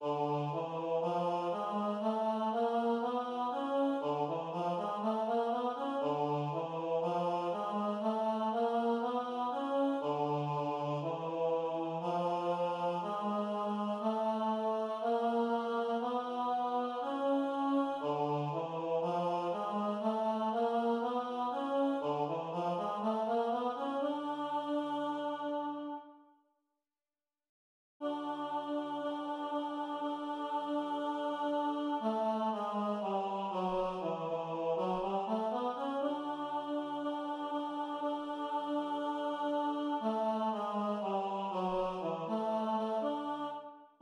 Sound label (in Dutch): Tenor